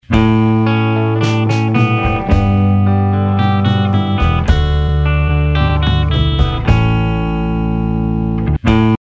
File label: No Effect, overdrive VST plugin; Dan Dupuis' Overdrive VST plugin; This plugin is an overdrive effect that is most effective on an audio file that contains a single instrument playing a si